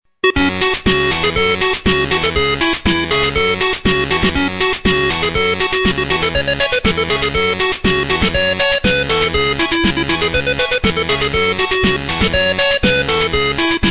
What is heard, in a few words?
8 bit